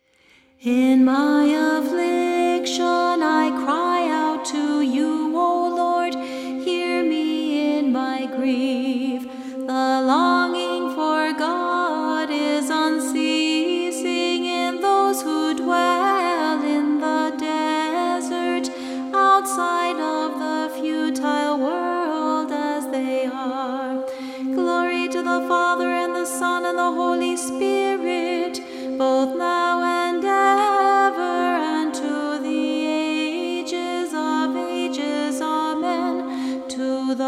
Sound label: antiphon